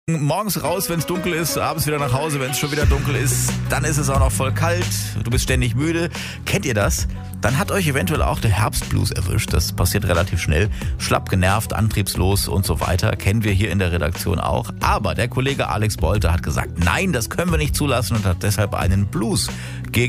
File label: blues; anti-herbstblues